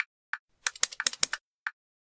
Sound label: 10 14 10 metronome; Acid